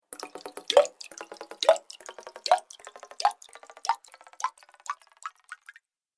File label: Water Bubbling Echoes, cartoon